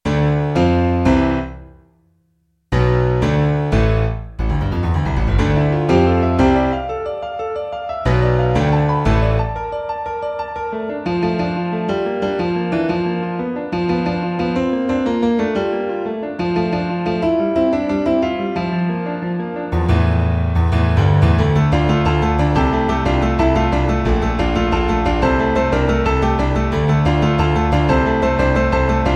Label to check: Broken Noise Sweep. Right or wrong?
Wrong